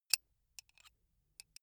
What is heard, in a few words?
bullet handle click